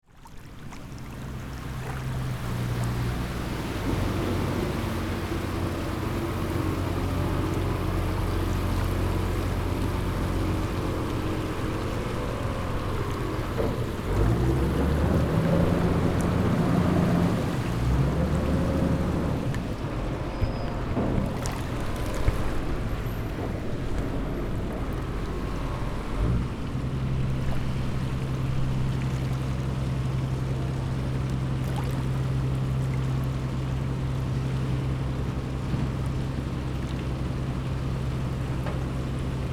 Boat passing by distant perspective

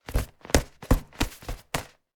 Inflatable beach ball taps hand hits

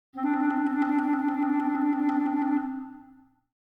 Cartoon clarinet trill

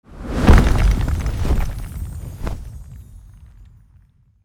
Magic dragon fantasy taking flight

